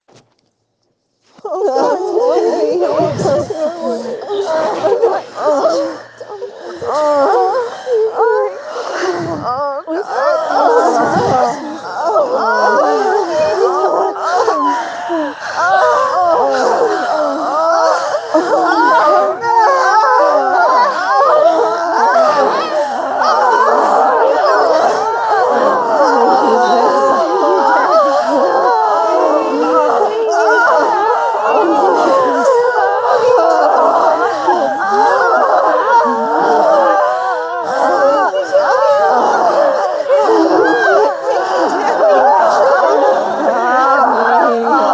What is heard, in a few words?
Chorus